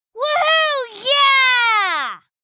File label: Little girl excited vocalization